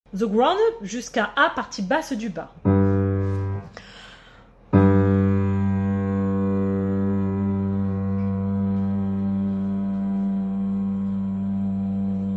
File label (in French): basse du bas